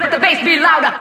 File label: Vocal Phrases; Vengeance Samples; Vocals